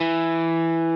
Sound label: Arp.